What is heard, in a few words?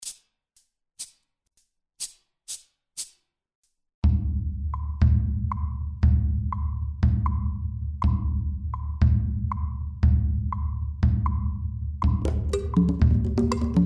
Kpanlogo perc only